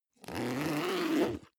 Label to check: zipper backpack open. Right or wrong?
Right